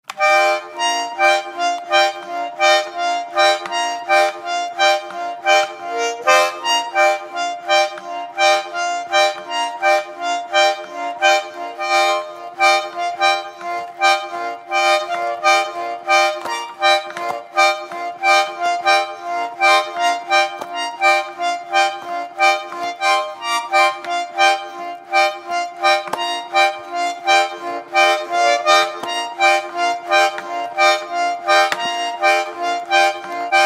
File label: Accordian Fast Song reverb